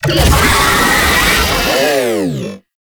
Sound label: Pain, Vocals; Warframe; Proto Infested; Babau